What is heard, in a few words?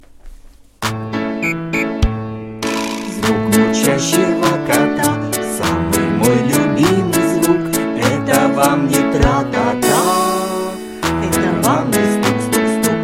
karaoke